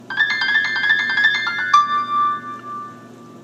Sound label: blues ringtone; apple iphone 3g 4